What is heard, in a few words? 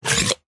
plastic cones stacked pull apart